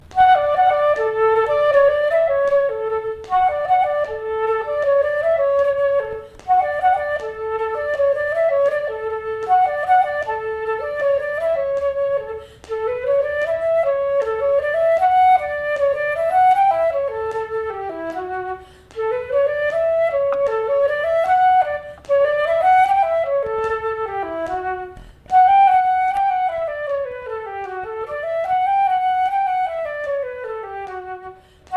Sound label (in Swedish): Engelska 2a Stämma